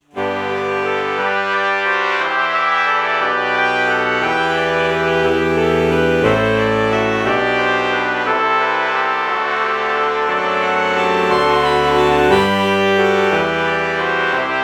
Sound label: alto saxophone trumpet morph